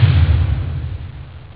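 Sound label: percussion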